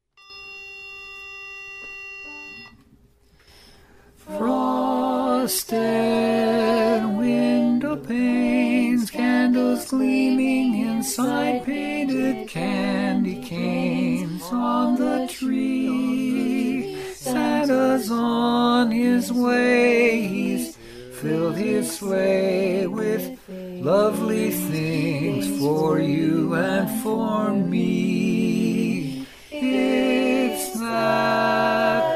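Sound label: baritone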